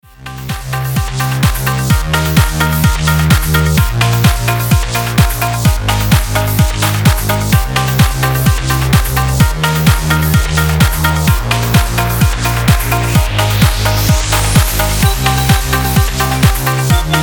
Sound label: Electro Drums Wet